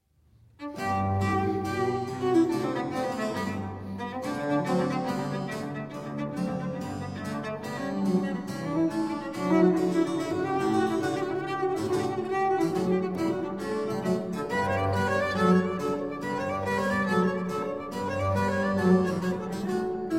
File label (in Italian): Sonata In Sol Maggiore, Allegro, Violoncello e Basso Continuo; Op1 No3; F Martino; Sinfonie and Sonate del settecento italiano per Violoncello e Basso Continuo